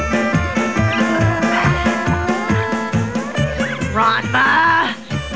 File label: growl